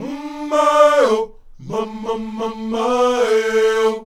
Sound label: UM MAY, Afro Chants